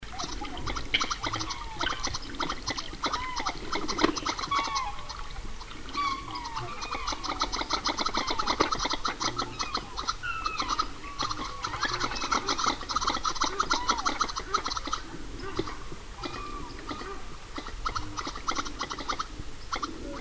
Australasian Shoveler

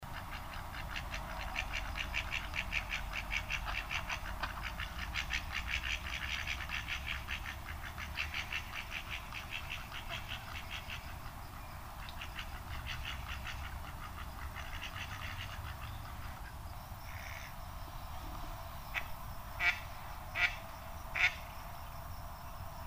Pacific Black Duck

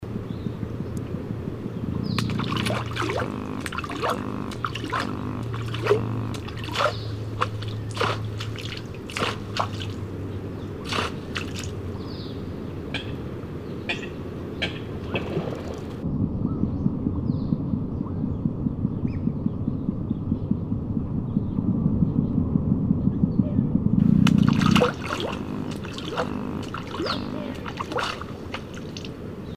Blue billed Duck

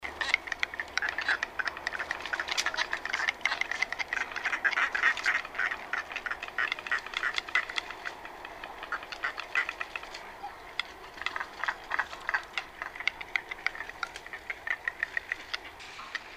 Little Black Cormorant

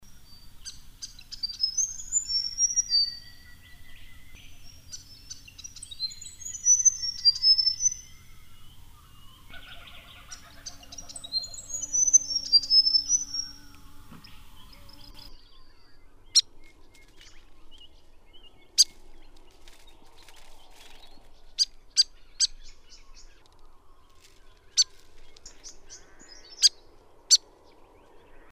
Grey Fantail

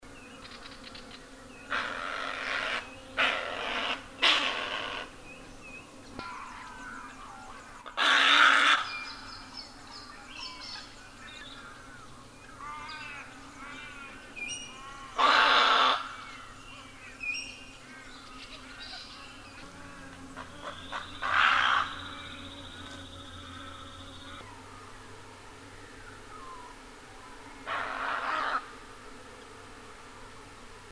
White faced Heron

